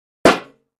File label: Aluminum Pan Impact; barrels buckets & cans